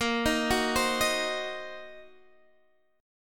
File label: Gb 6th Flat 5th Bb, guitar